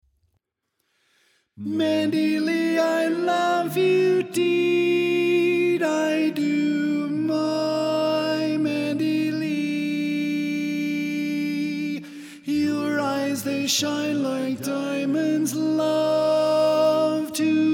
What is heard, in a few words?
Tenor